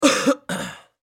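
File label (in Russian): zvuki pershenie v gorle kkhm, sounds tickle in the throat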